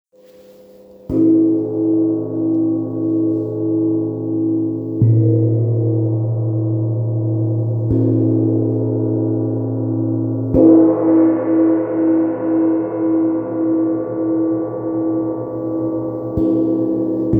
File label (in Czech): jing jang, vetrny feng gong yin yang 50 cm